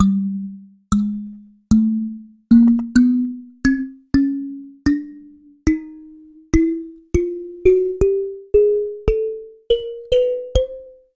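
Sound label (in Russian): ванная комната thumb piano standard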